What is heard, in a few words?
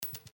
Coin